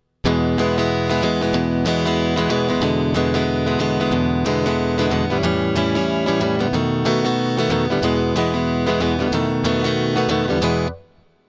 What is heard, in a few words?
blues prog